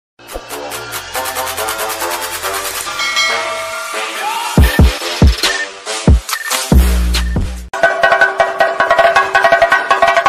chenda melam ringtone kerala festival ringtone kerala drums ringtone